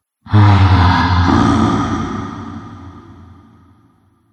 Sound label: Zombie Boring; horror; Zomby Sound Effects